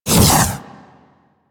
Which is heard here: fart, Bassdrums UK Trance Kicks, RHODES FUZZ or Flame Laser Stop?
Flame Laser Stop